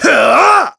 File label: Big Attack; Ghirahim